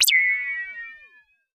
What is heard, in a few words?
laser; lasers